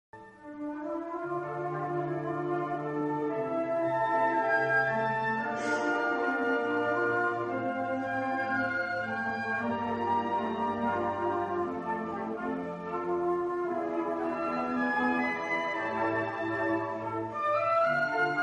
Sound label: concert, flute; autumn concert 2019